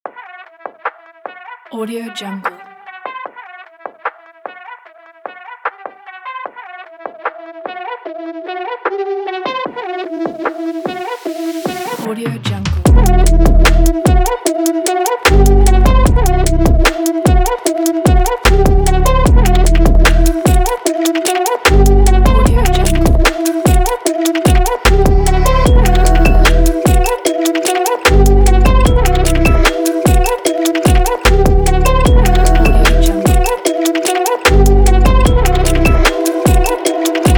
abstract trap

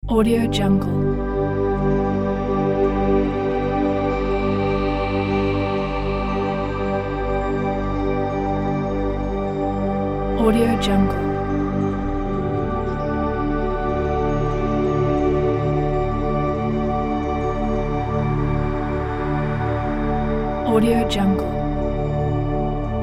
meditation

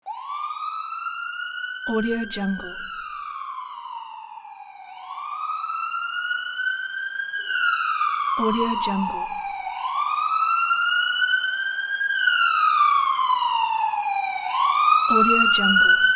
ambulance siren

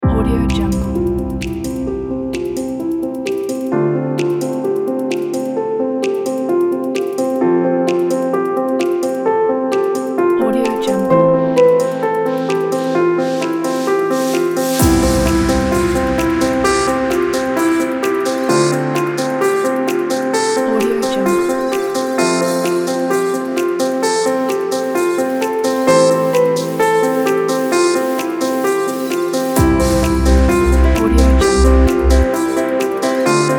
inspirational atmosphere corporate kit

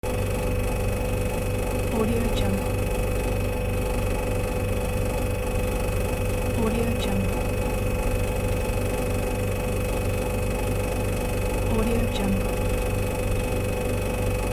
old fridge

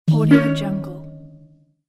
african blocked alert

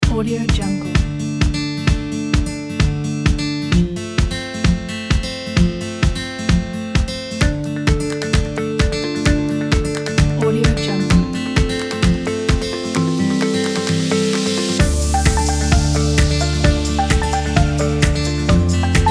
indie folk; mix-o

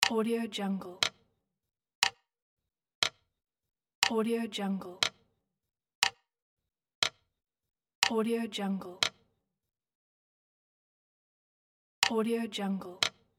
hall clock ticking

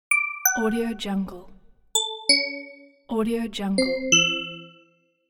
message alert

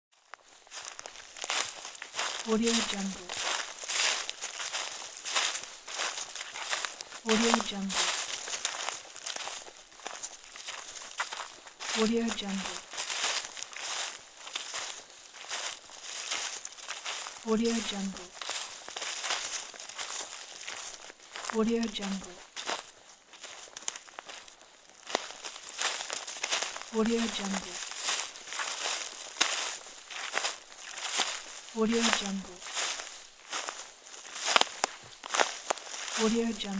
walking through woods

